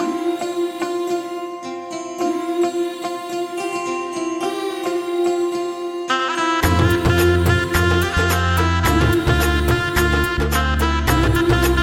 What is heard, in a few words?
Instrumental Music